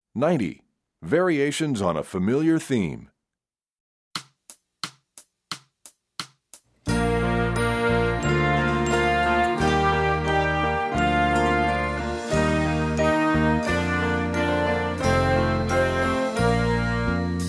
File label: Variations, Brass WW; EE2000 Book1